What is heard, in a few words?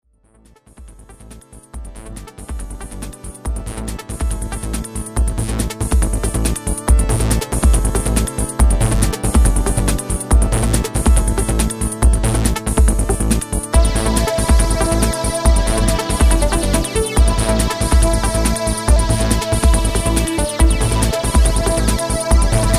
korg ds 8 pure 8x